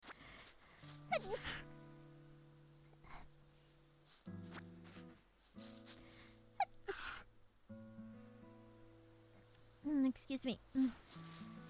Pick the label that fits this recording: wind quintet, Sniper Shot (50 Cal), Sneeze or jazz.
Sneeze